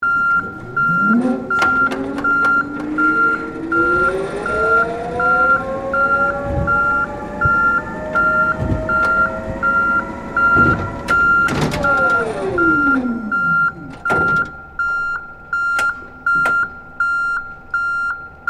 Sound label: Hi Lo Operate Beeps, Industry, Hi, Lo, Operate, Safety, Beeps, On, Board, Perspective; Machinery; BlastWave